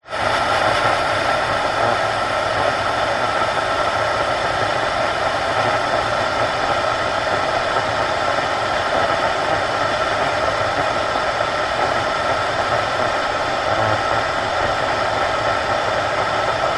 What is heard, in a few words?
vehicles car radio static faint signal talking indistinguishable speaker; zapsplat